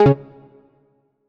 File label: check off; Minimal Weeb - Noel v1.3